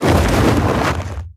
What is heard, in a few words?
sweep tail